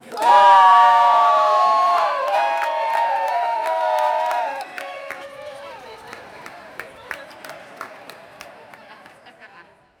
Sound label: an audience in a concert